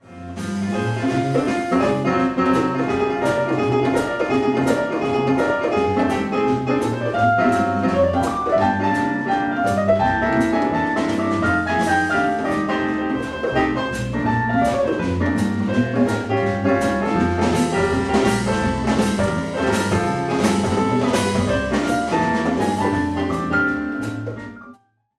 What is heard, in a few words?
jazz trio piano bass drums